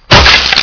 bull wood, weapons

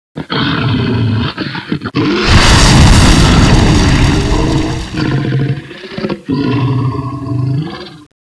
burning; revenant; npc revenant

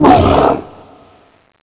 warp attack ready

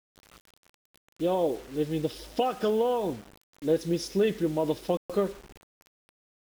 mmm

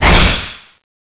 zombie ghost mid slash; ghostfury

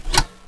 cross foley, weapons

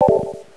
denied

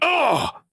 human hurt

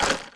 hook retract stop; skeleton hook

